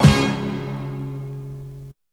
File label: shot; BEAT2000